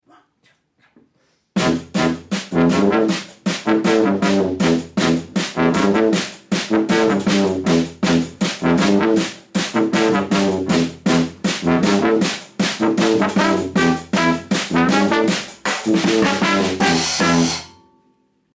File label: Jingle